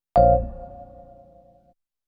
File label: a stab; hip hop beat battle; loosely rock themed